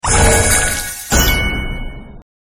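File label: Hero Level Up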